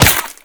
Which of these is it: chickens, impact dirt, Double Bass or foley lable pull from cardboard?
impact dirt